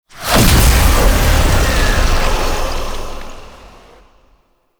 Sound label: Death Stinger; Enemies; Warframe; Entrati Doppelganger